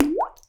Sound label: Plip